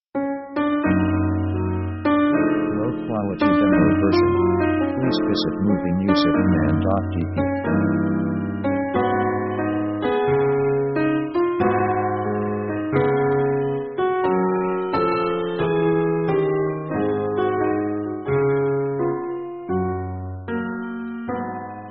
piano ragtime style; melancholic; melancholic themes

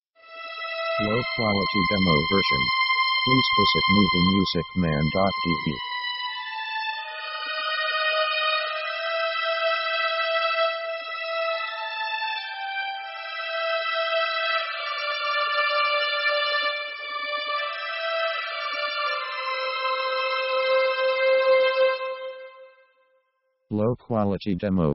orc trad love theme; orchestra themes

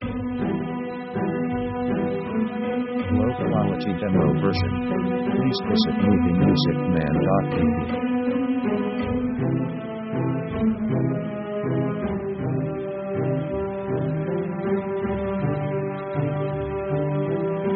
orchestra italian; orchestra themes

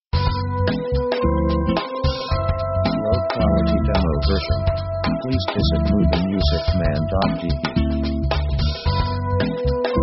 cool fusion

